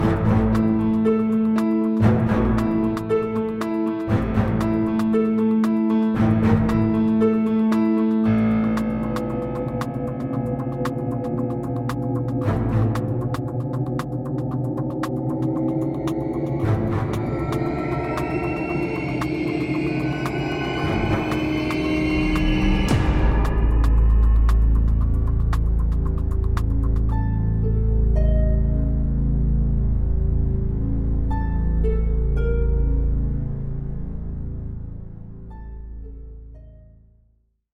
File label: Night Rhythm